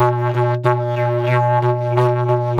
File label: Didgeridoo; DIDGEHORN2